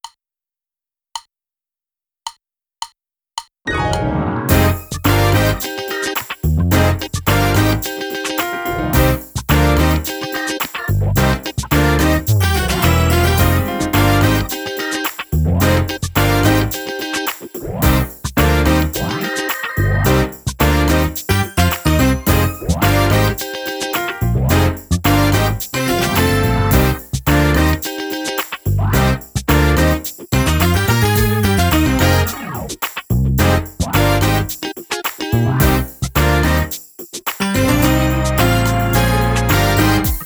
drumless

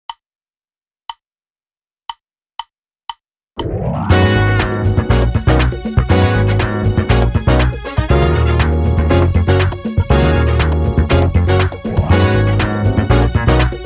Groove drumless

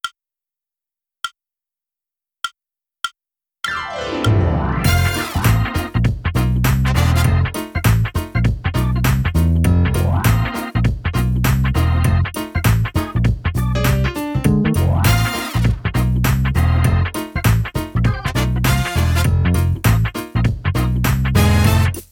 drumless

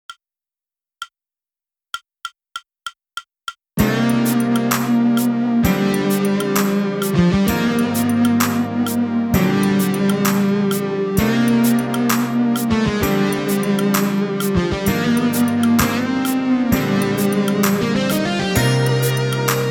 Fusion drumless

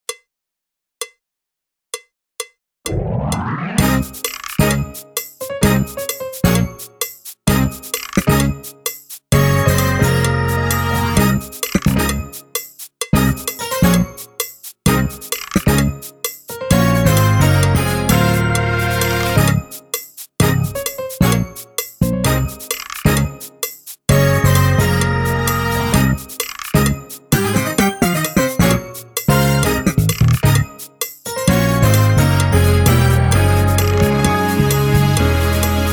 Fusion drumless

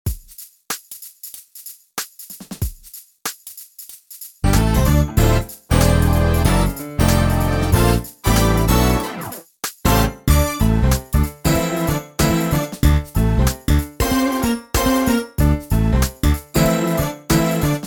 Gospel Funk drumless

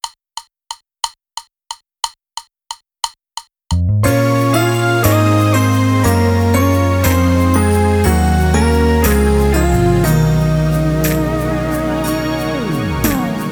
Fusion drumless

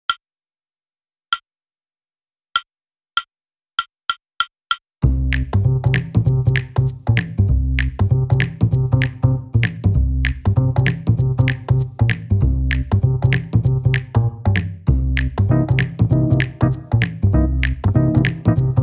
Jazz Groove Loop drumless

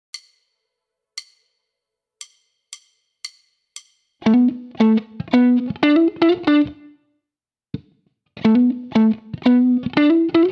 Funk drumless

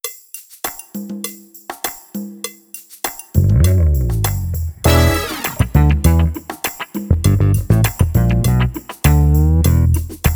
Oh So Funky drumless

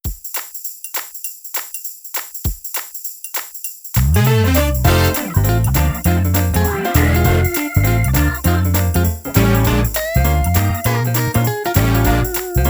Kinda Churchy drumless

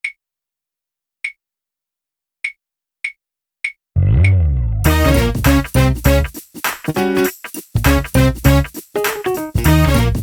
Jam drumless

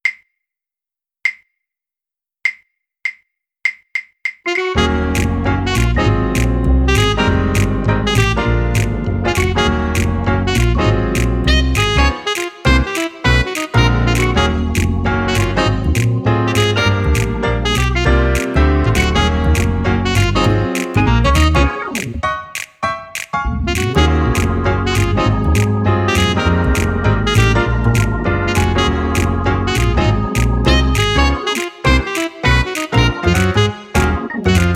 Jazzy drumless

